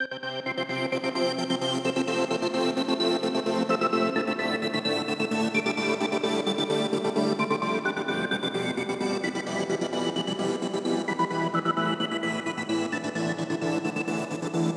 Pad

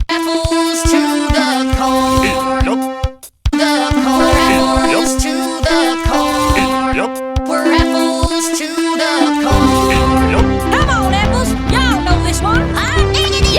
Electro Jazz remix

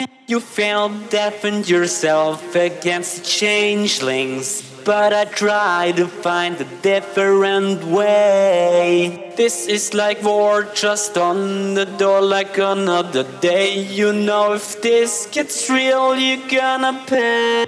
Vox, 105 BPM; STEMS

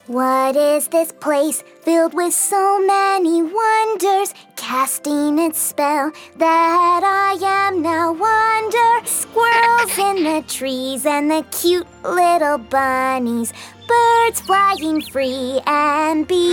(Vocals); My Little Pony OST Repository; Season 1; Bonus Music

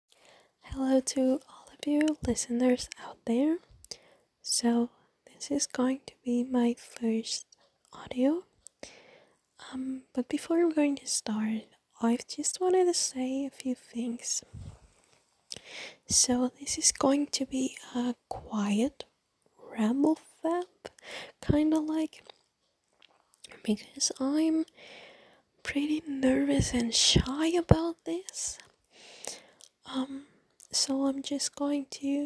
quiet, nervous, shy, heavy breathing, wet noises; F4A

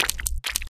Slide; Lewd SFX

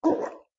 Gag; Lewd SFX

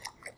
Sex Slide

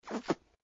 Suck; Lewd SFX

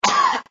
Cough; Lewd SFX

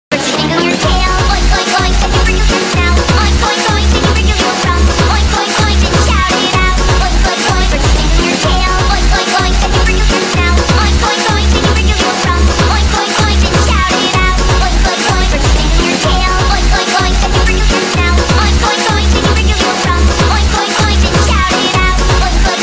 Piggy Dance DnB ReMix Oink Oink Oink Song

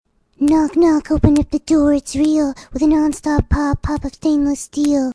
Singing; Fluttershy

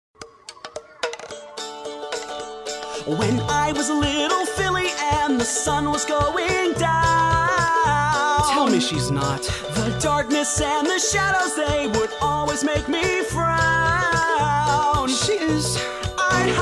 Vocal Cover

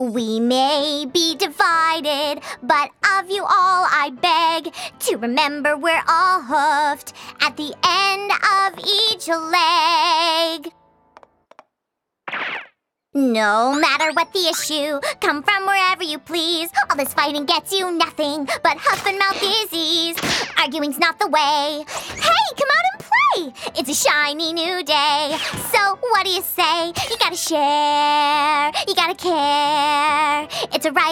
You've Got To Share (Vocals); My Little Pony OST Repository; Season 1; Bonus Music

